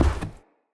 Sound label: Step Wood, Footsteps; Enemy; Characters; Corrupted Sproutfolk